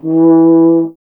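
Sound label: brass French horn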